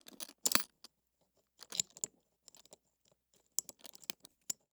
pick lock

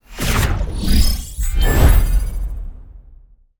ui load

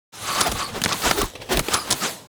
arrow rain

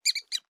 mouse

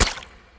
water ball

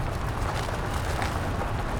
wheel scree

